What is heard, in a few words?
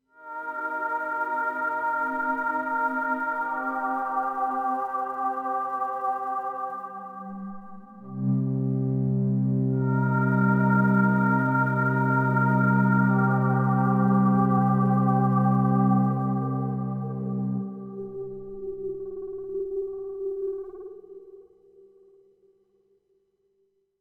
Day Stinger